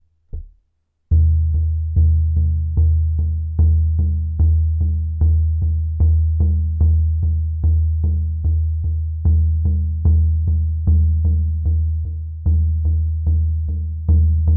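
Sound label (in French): 40cm, tambour chamanique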